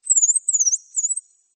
Digital birds

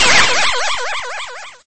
Ship loss part

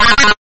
Robot talking

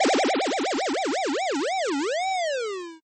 Bobbing square wave vibrato

